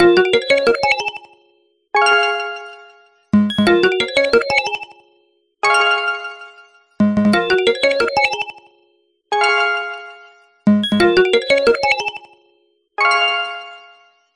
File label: ringtones